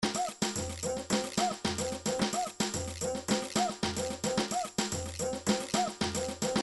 midi drums partido alto

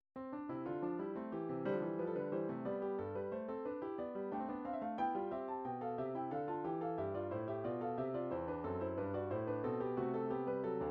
piano, midi; piano version of bachs two part inventions

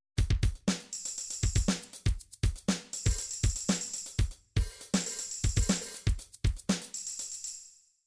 midi drum patterns drums

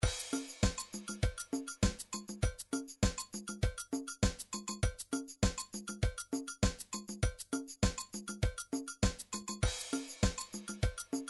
midi drums, disco

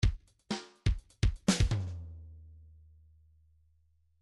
fills pop fill; Midi File

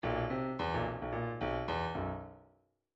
midi grooves rock

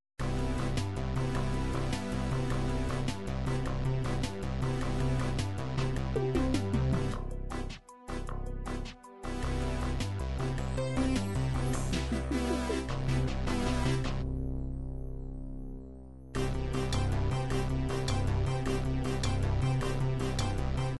synth boogie

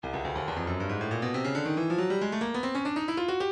stylus breakbeat midi 138 bpm

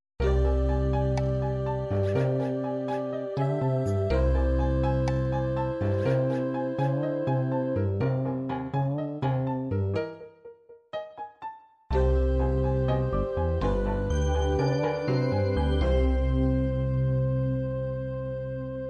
ballads ballad new age, midi

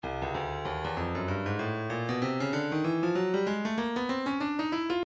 stylus breakbeat midi 096 bpm

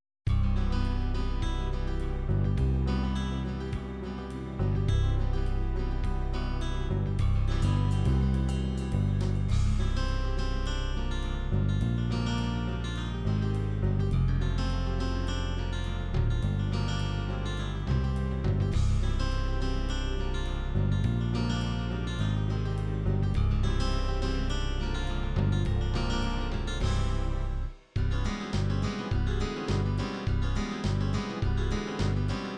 rock folk rock, midi

